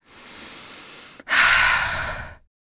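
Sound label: female breath; Spectrasonics; Vocal Planet; Jazz FX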